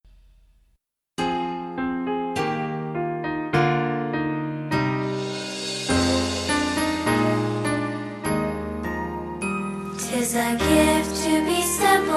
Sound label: shaker music; tis a gift